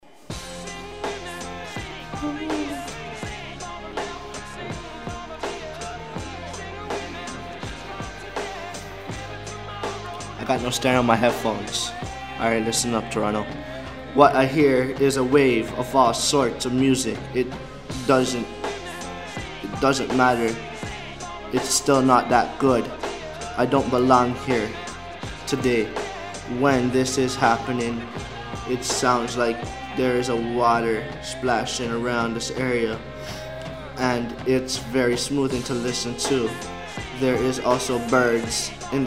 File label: Music